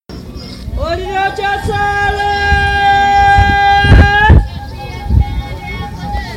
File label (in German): geraeusch lang laut